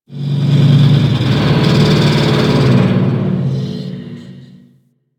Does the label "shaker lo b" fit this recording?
No